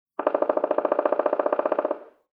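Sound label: black woodpecker drumming, birds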